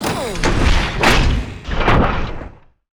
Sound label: Die, Mob; KSokumGuard